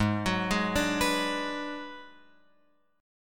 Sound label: Ab Minor 7th Flat 5th, Guitar, Open F; arpeggiated; x,3,6,3,5,6; down